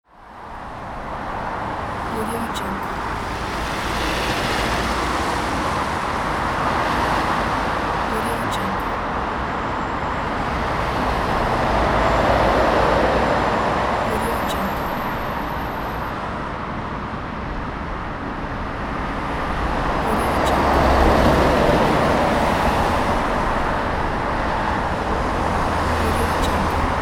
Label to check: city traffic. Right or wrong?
Right